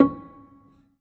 Harm High, Piano Harmonics

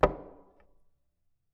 Music Stand Sgl Knock, Piano; Piano Miscellaneous

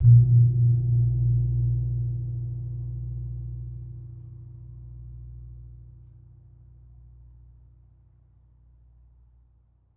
Suwukan, Gamelan

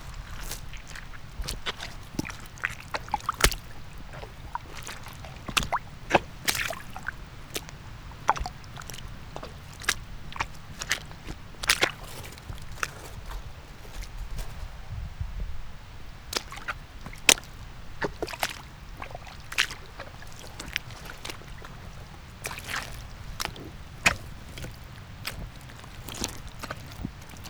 Mud

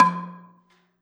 Gambang Hard Beater, Gamelan

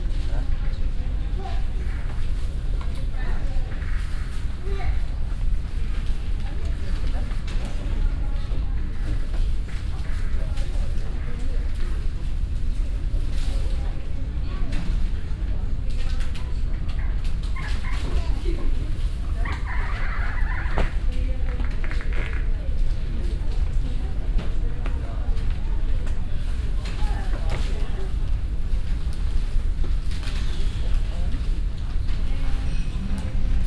Departure Lounge, Airports; Frankfurt; Sound Scapes

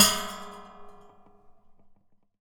Classical Guitar Buzz Pluck

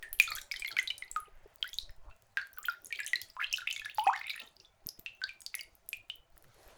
Dribble, Water Dribble

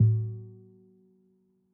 Classical Guitar Marimba Chunks; Classical Guitar Extended Technique Stereo

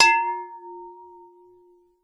Water Clinks Mono, Glass Clink In Water

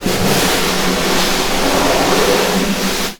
Snare Drum; Percussion Samples

